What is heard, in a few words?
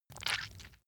leather impact mud soft squeeze